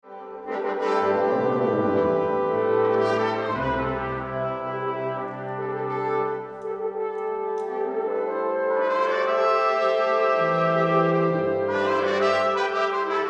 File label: Fanfare